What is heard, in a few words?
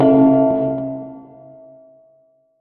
fm hit (A); Sonic Foundry (Sony Creative Software); Ugly Remnants VOL-2